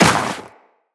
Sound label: Walk Snow, Footsteps; Tenno